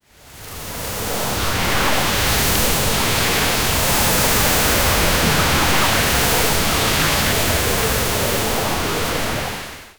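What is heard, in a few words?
scifi water splash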